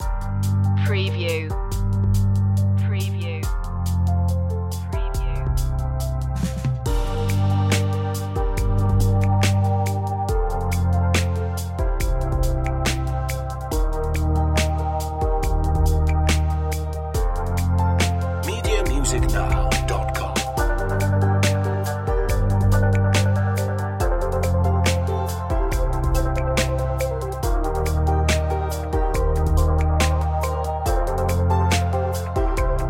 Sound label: downtempo chic, A chill out electro track, made of deep drums, synth bass; electronica chillout